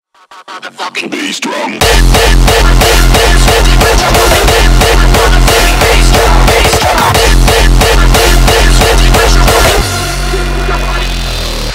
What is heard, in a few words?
bassdrum